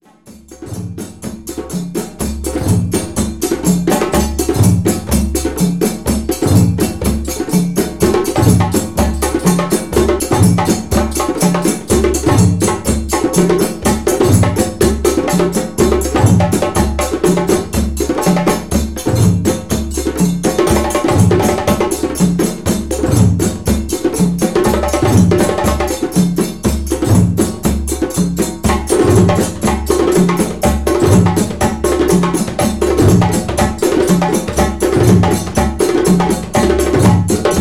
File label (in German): Djembesolo